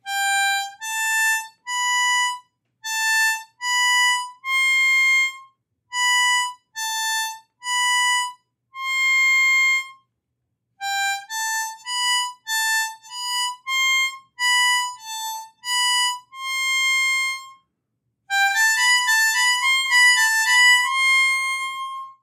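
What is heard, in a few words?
Triplets Ascending, harmonica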